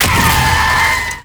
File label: Bike Damage; Rusalka; Warframe; NineteenNinetyNine; Stage8ShitboxConvoy